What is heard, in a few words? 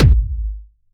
Harder, kicks